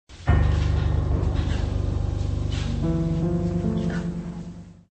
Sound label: dramatic